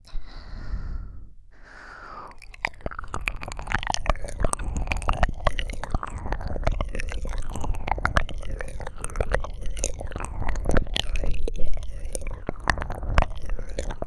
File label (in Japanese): 左脳舐めコース